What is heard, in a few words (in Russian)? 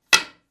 kiy